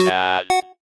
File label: retro